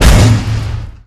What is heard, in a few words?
Weapons Rocket Launcher